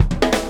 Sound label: FILL, Grooves Filz Hitz